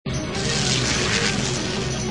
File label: plane